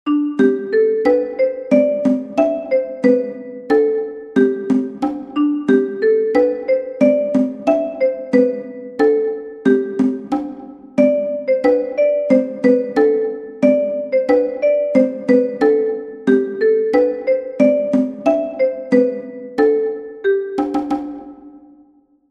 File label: Cajon; Ein Maennlein steht im Walde